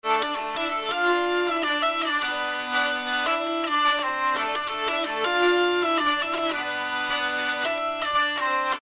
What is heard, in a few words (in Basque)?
Zarrabete melodia REm 110 BPM